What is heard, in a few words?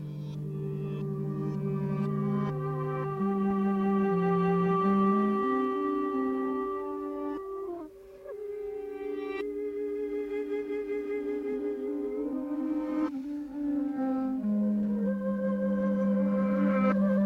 music for zen meditation backwards